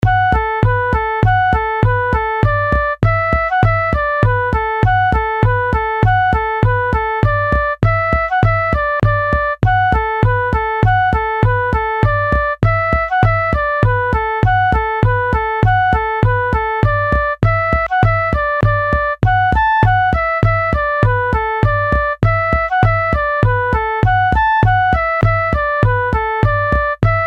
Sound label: Polka with slow rhythm